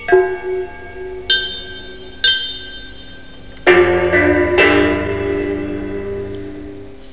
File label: china loop